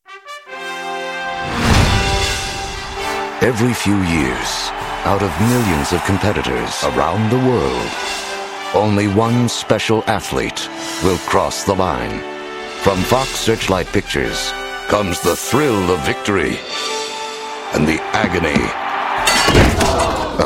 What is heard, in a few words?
ringer